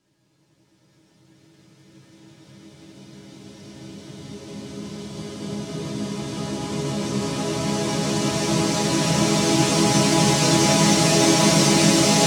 140BPM Chord C#, Melody Fills; Cult; 8Bars; Vengeance Samples

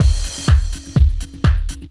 drm 125, drumloops bassworx; house; PS-28 Vocal House Samples

